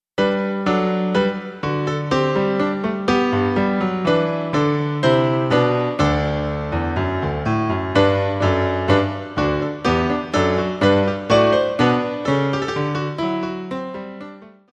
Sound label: Graduation March solo piano